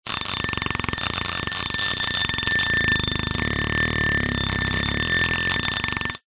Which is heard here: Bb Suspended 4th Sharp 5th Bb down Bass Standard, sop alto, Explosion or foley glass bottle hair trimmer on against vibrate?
foley glass bottle hair trimmer on against vibrate